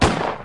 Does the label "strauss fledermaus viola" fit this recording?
No